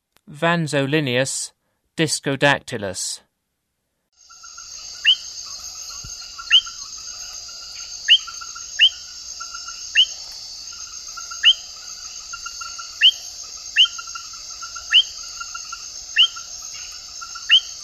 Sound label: Vanzolinius discodactylus, Frogs of Ecuadorian Amazon; S. America